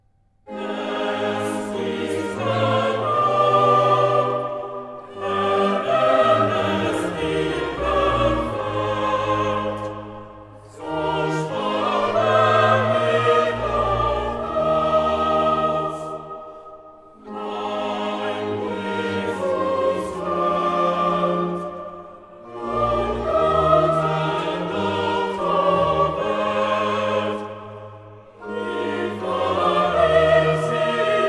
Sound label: Choral (Chor); BWV 60; O Ewigkeit, du Donnerwort; Es ist genug, Herr; Bach, Johann Sebastian; Cantatas; Klassika